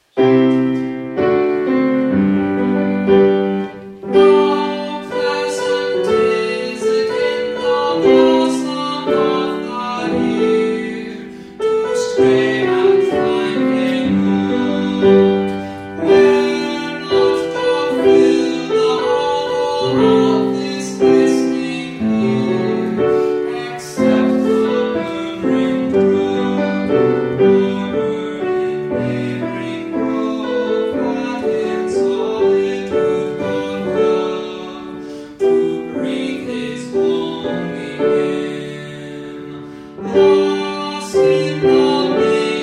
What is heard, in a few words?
Folk Song